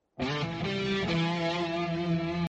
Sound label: cool guitar musical short message tone; iphone sms ringtones